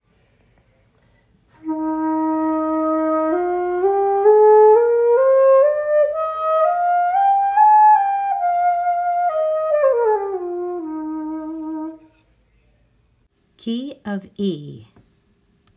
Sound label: Key of E, bamboo flutes